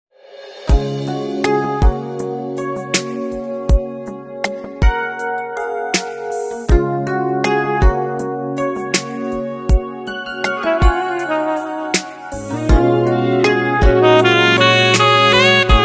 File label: rhodes